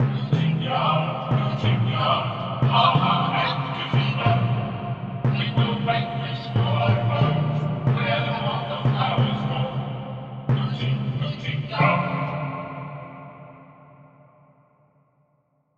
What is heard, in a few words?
Chant